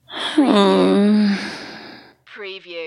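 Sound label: Female Soft Groan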